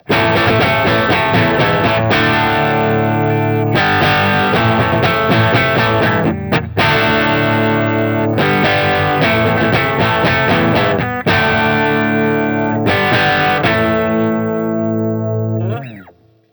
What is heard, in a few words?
crunch bridge; custom77 cs4